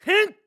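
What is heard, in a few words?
Sword Spin, voice; Link; BOTW; Breath of the Wild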